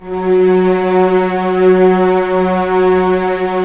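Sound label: cellos, forte, orchestra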